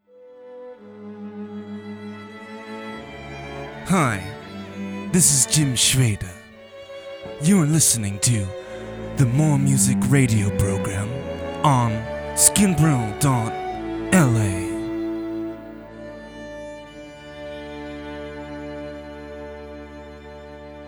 classical

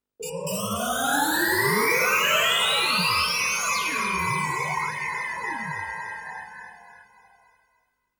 Metallic Sounds

